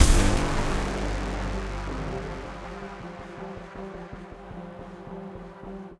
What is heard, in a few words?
Sonar Fight C3 4 Bars 160 Bpm; gamerip; Dispatch; PS5, Windows